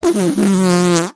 fart

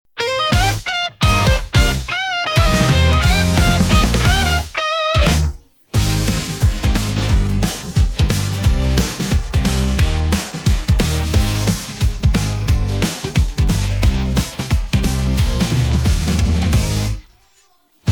Instrumental

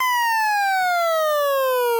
snd Title Falling

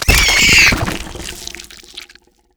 spider frostbite giant death; npc; Skyrim Special Edition

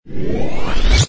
refuel

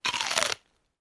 human eat celery

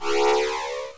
charge; doublespeakgames

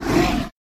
werewolf Short; halloween

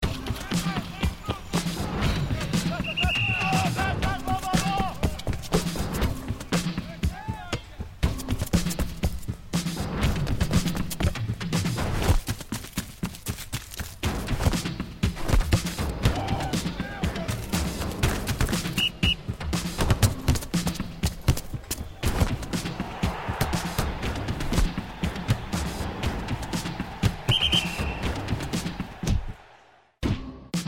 soccer pitch, music; Flashpoint; pandandago; football

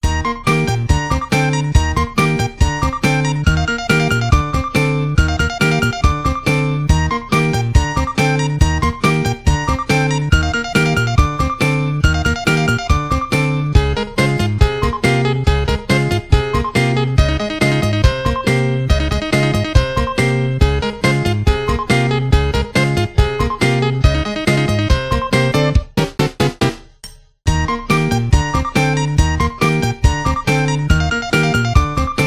menu

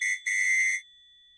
chain; tenaPuyo

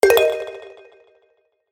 progress reset; easter

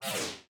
slow down; CrossCode

